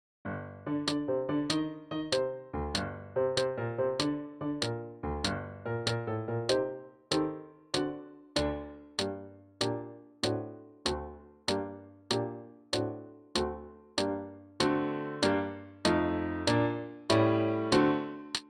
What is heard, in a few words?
Accomp Only w click, Clarinet; Solo Accompaniments; March of the Marionette